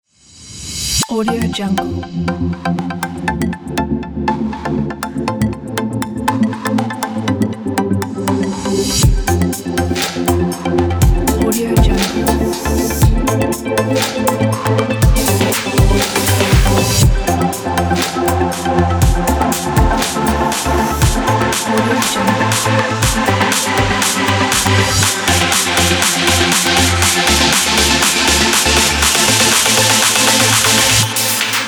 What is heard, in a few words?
dance fashion technology